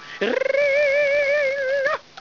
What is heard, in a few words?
riiiing